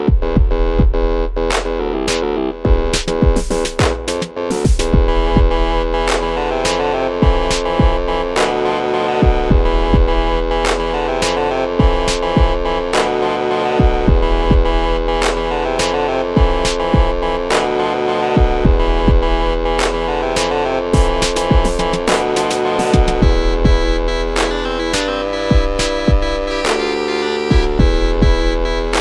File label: Hip-hop Crunk